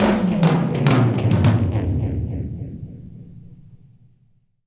Fills 106; Zero-G; Monster Beats

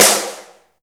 EFEX SD, FX DRUM

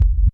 REV PWR KIK; Back In Time Records; Big Bang

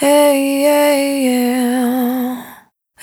Single Voice Melodic Loops

